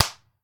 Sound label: clap; smite; gilgamesh